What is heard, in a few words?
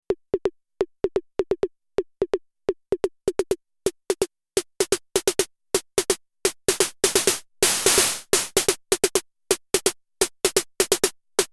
twincussion snare